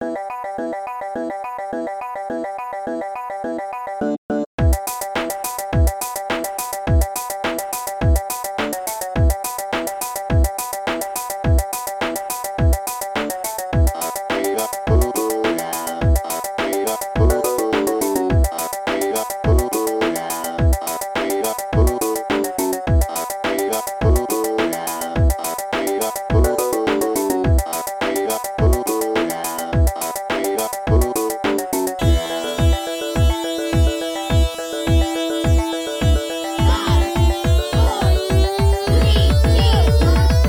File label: Dubstep